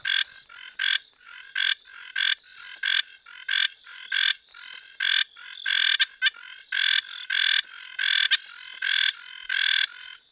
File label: Hylidae Scinax elaeochrous